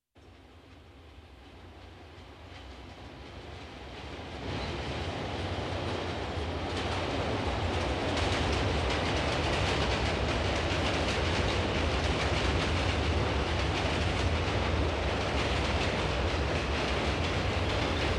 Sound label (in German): müllwagen im hinterhof; Großstadtgeraeusche